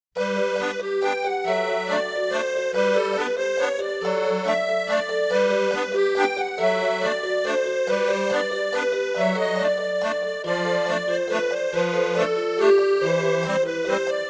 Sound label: Organ Glinder; Birdy The Mighty; OVA OST II Blew